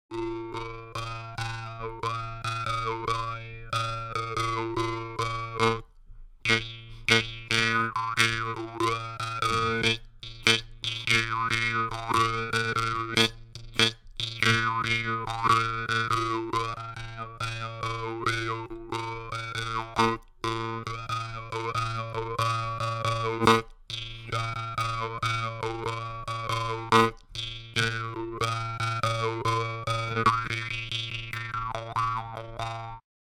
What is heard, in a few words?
morsing, jews harp